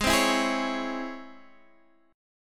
Db Minor Major 7th Ab

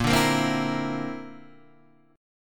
D 7th Suspended 2nd Sharp 5th A sharp

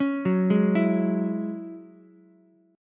F sharp 7th Suspended 2nd, Ukulele; F#7sus2 arpeggiated

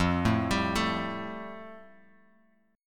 F Major Add 9th Guitar Open F; arpeggiated; 5,4,7,4,x,x; JGuitar

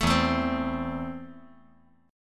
E Suspended 4th Sharp 5th; 2,4,5,3

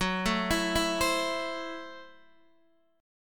F sharp Minor 7th Flat 5th F sharp Guitar DADGAD

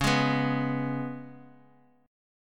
Ab Minor Double Flat 5th Db

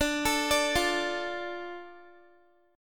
D Minor D Guitar; Dm arpeggiated

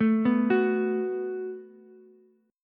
F sharp Minor Double Flat 5th, Ukulele; arpeggiated; x,9,7,9; down

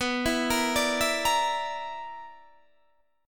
B-Minor Major 7th Double Flat 5th, Guitar DADGAD

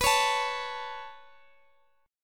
B 7th Suspended 2nd, Guitar, Open D

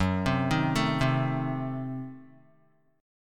Gb Diminished 7th, Guitar; Gbdim7; arpeggiated; 1,3,3,2,0,x; 4F,4A,5C,5G,5C,6E

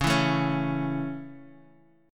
A Major C sharp, chord

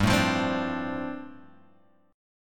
G 7th Suspended 2nd Suspended 4th, Guitar Open F

